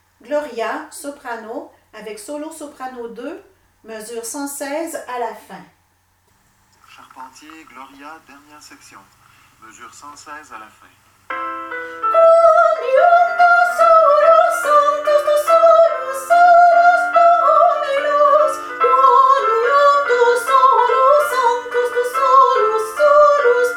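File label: gloria fin soprano solo; charpentier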